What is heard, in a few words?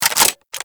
Clip Out, Shotgun; Warframe; Weapons; Strun